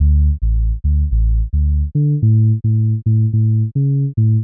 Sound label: k pop bass loop 108bpm d major